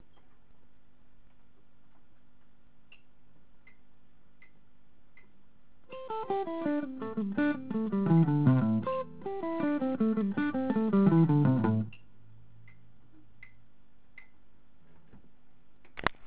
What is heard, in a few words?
alternate picking